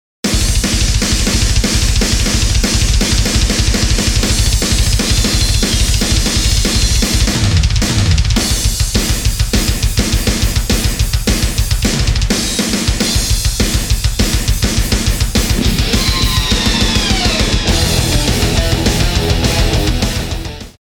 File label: drum intro